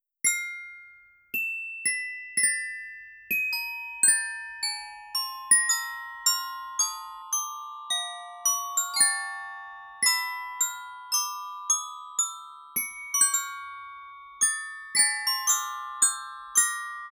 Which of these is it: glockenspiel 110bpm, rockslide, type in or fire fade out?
glockenspiel 110bpm